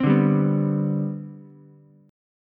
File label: C Major 7th Suspended 2nd C down Ukulele, chord; CM7sus2 strummed; JGuitar